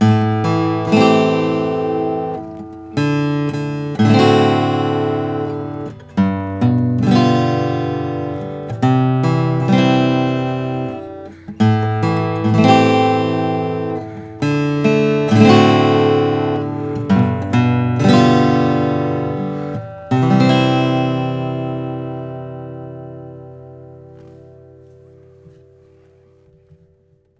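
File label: bourgeois 0 classic german spruce natural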